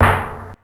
Snare, Ensoniq ASR-X Pro

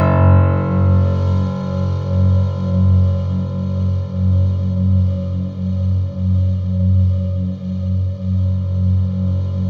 F#1, Synth Line

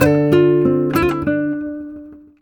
D MIN 7TH ARP, Nylon Guitar

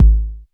K909, KICKS TR 9+8

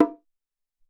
Bongo Lo, Alesis SR-18

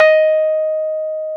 D#4, Electric Pianos; Wizoo Electric Pianos

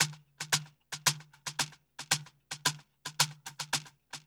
SHEKEK R; Spectrasonics; Liquid Grooves 1 & 2

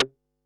snaredrum, Pearl SC-40

